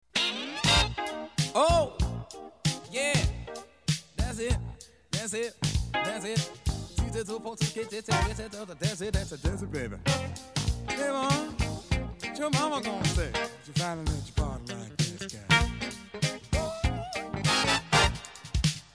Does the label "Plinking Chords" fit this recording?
Yes